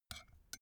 put tea spoon in cup; berufe; gastronomie; soundpool

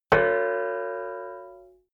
plate hit ring stopped; hits impacts

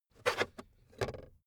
car backseat table pull out cupholder slow bounce

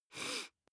nose sniff male sick soft; koerpergeraeusche; menschen; soundpool

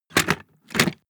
movement metal storage medium, box; objekte

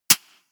clap, percussion

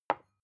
glass put down; objekte

